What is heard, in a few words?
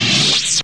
Saber OFF